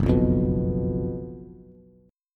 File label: Gb Major 7th Suspended 4th Gb Bass; GbM7sus4; strummed; 2,2,3,4; JGuitar